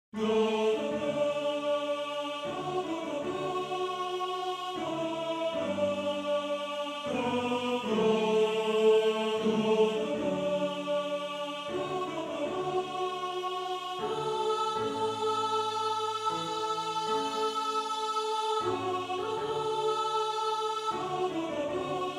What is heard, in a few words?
Tenor; Tenor 1